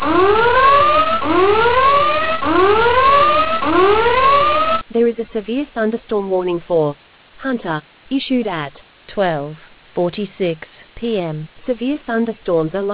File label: storm audio